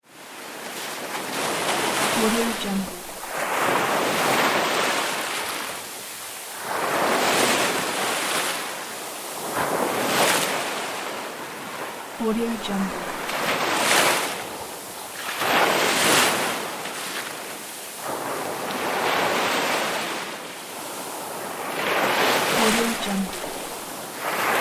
ocean waves crashing; alexander-blu

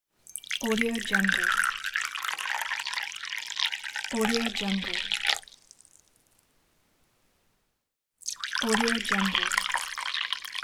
pouring into a cup

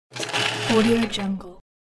bicycle braking; applehillstudios

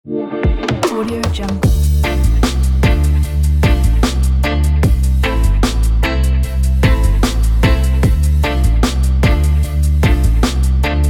reggae

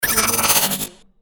glitch robotic scan

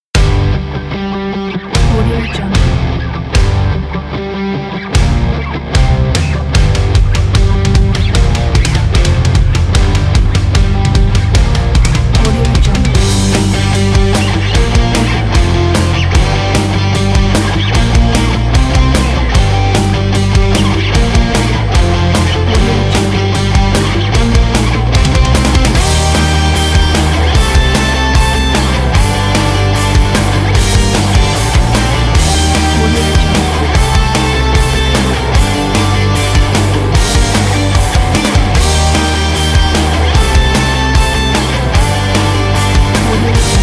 promo sport rock

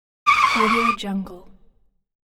car slamming on the brakes

